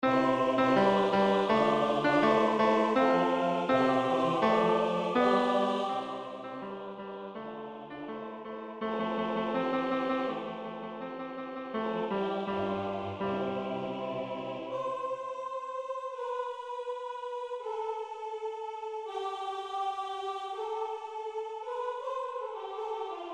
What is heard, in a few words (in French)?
Alleluia SATB; Alleluia de Boyce